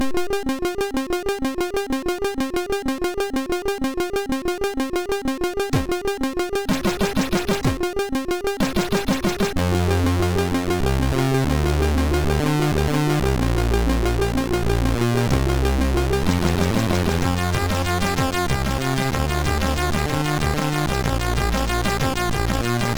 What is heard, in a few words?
Mix sid MOS6581R2; Huelsbeck